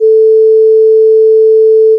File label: Sine Wave